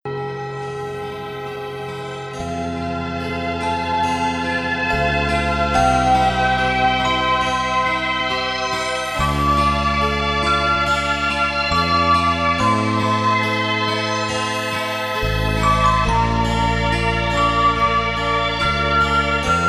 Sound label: music of the paradise, 432 hz; SPA Music